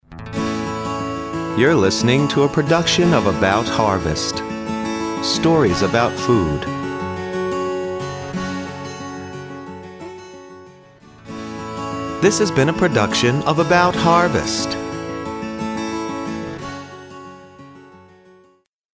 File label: harvest jingle